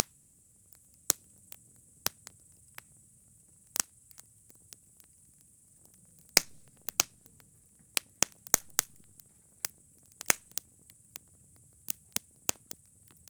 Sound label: fire; best service Blue Box